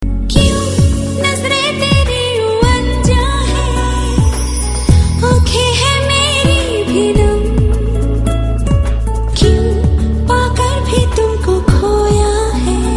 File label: Female